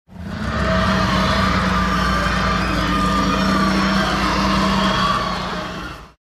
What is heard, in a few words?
Roar; Lizzie